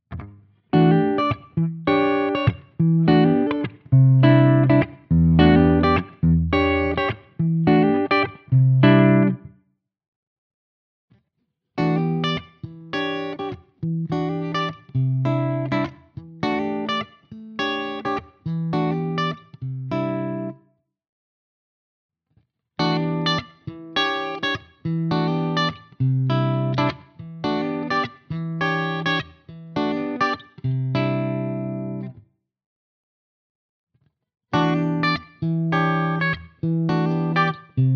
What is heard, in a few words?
clean fingerstyle all positions